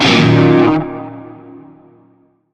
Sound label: Shawzin Blues Chord; G; Warframe; Narmer Shawzin